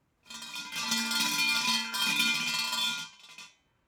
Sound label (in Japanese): 猫島の神社の鐘